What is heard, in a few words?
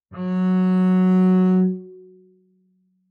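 Bass arco fortissimo sul G Gb4, Double Bass; MIS Pitches - 2014; Strings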